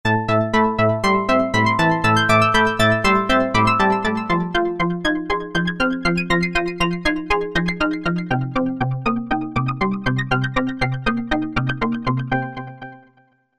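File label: Sequence, Roland V-Synth; Factory Patches; 128 Li'l Sequenz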